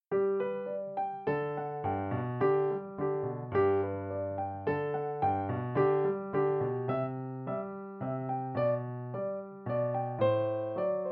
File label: menuet